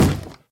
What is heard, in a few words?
wood, zombie; Minecraft; mob